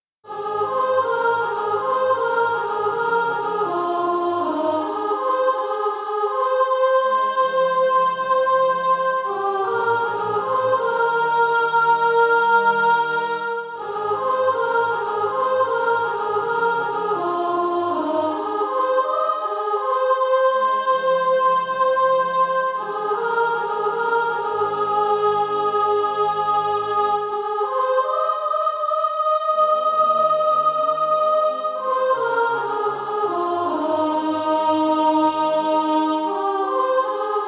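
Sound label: soprano, Practise Music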